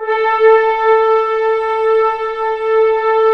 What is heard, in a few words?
ORC A3 PP, Orchestra; Sound On Sound - Peter Siedleczek's Orchestra